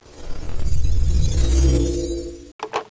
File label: player leave, notifications; fisk city